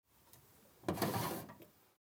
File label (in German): Schublade klein